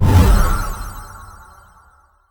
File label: transmute spell; Crypt of the NecroDancer